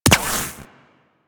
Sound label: Shield Impact